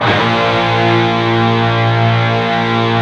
PRS, Guitars, PWR SLID

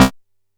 C3, Bass; E-MU Producer Series Vol. 6 – Analogia Project; Boom da Bass

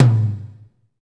tom, lite jazz, Roland; Northstar; Drumscapes Roland; AC Lite Jazz; Toms

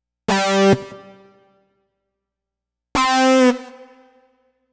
Lead Synth Lick; Dr. Fink's Funk Factory

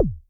BD Rave, Electric Kick, Yamaha DTXpress

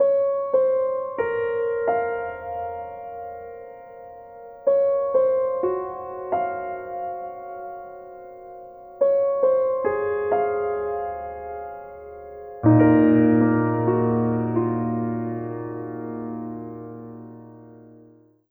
Piano

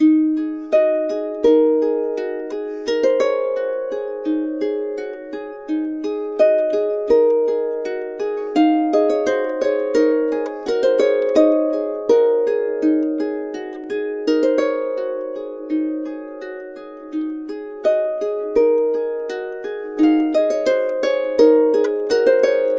84, Complete Harps; USB Soundscan vol.62; AKAI; AUTUMN 84

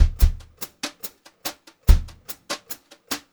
144 vari, Brushed Drum Loops; USB Soundscan vol.39; AKAI

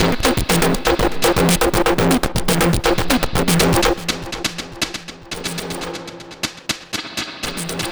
Percusso Distorto 121, Lounge Drumz

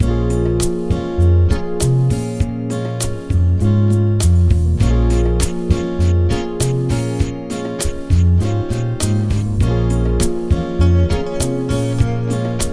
Bass Grooves; BRAZIL; USB Soundscan vol.30; AKAI

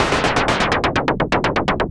SH101 Clocked LFO Rhythm (125BPM)

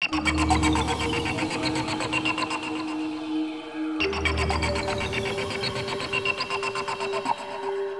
Synth loop (120BPM); musicradar

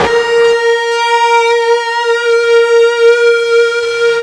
FUZZ A#3, ULTRA FUZZ, Guitar; East West; Steve Stevens Guitar Samples Collection

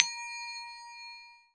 TEMPLE BELL, TEMPLE PERC; AKAI S-Series CD-ROM Sound Library VOL-5

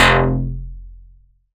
bass F1, PPG Wave 2.2

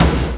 TOM D, Drum Dry; East Collexion; Drum 1

